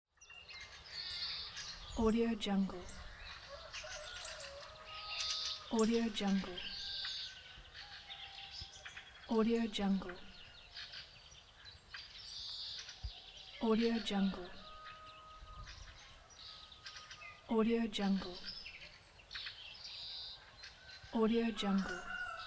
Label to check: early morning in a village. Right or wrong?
Right